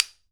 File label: CLAP, KOREAN DRUMS; AKAI S6000 CD-ROM - WorldSounds